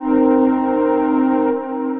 GrayScale Pad; Ableton; Factory Packs; Punch and Tilt

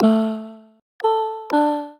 Digital Pop A min, Complex Vocal Racks; Voice Box; Harmonic Vocal Racks

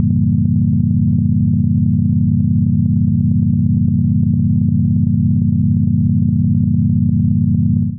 Minor Thirds C1-Bb2; Notes and Clusters

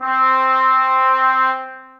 Trombone Section Mute Legato, Orchestral Brass; Ableton

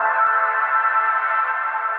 Dark Meow Pad; Synth Pad; Ableton; Factory Packs; Synth Essentials

